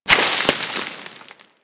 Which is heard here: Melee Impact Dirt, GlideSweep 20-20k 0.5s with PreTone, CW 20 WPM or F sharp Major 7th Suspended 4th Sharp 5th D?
Melee Impact Dirt